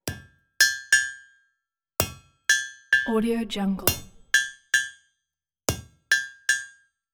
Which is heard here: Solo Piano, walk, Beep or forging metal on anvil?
forging metal on anvil